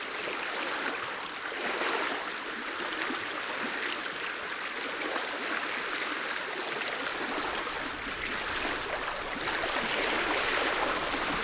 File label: lake bank; firefight